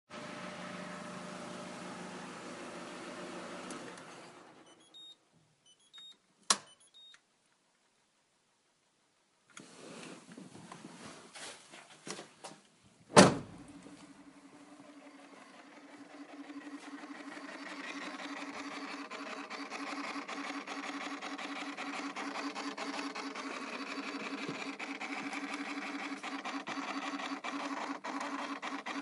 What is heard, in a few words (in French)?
bruit 307